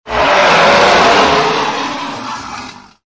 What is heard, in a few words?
death, rancor; valkyrie npc pack; fallenlogic